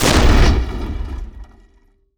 Hit Med In, Damage; DCS

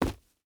footstep hide dirt jump land; Rust

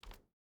footstep barefoot wood walk; Rust

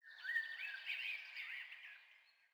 sting songbirds; Rust

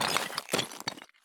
turn tile; Escape from Tarkov

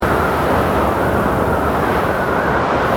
ambient battle; World Of Warships

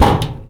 roadsign large impact

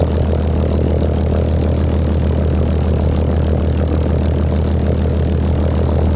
griffon rear, engines; War Thunder

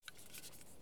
draw; zvezda; Escape from Tarkov

